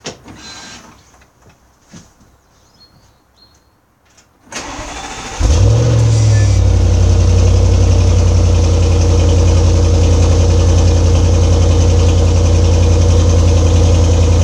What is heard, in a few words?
Audi S8 cold